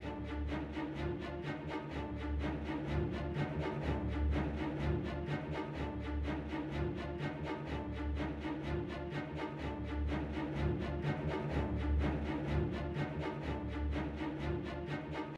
music interactive; World of Tanks

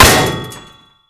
Projectile Sounds; Red Orchestra Ostfront 41-45

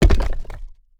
furnace large deploy; Rust

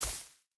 footstep hide grass jump land; Rust

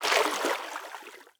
stag footstep water hard; Rust

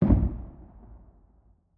beancan grenade explosion distant; Rust

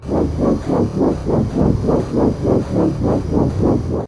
ship engines; World Of Warships

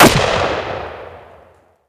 medium far distant, weapons; Modern Warfare

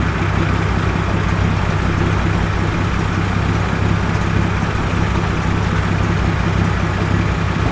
truck idle

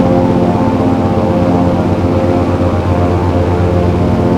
an2 cockpit r, engines; War Thunder

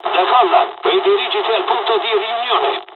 takeoff; dialogs italian

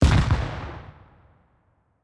plane; World of Tanks

